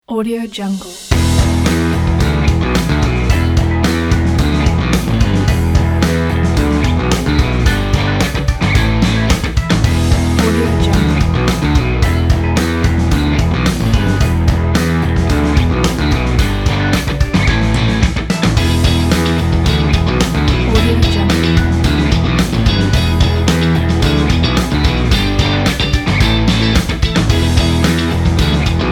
this is rock upbeat

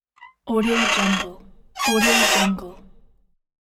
squeak

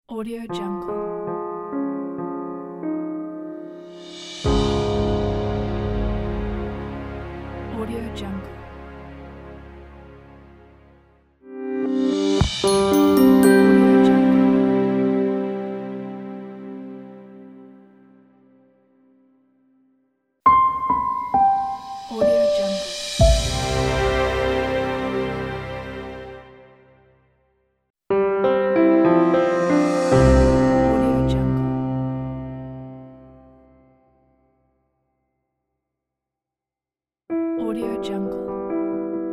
clear logo pack

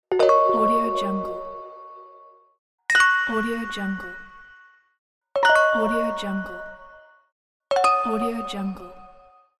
correct answer

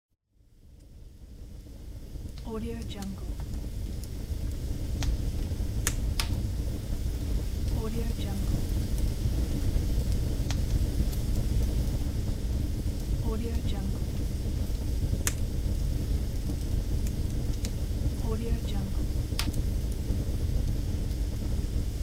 fireplace

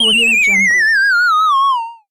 comical descent

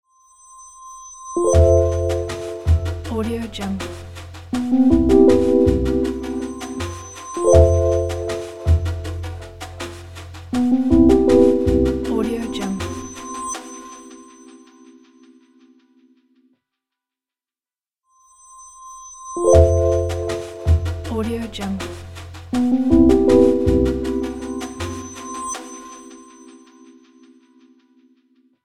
simple beauty logo

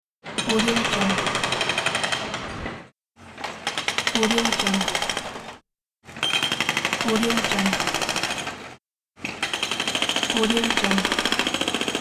jack hammer

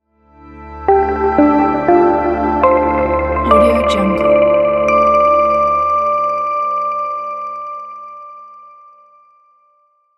technology fluid logo

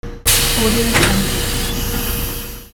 bus door open

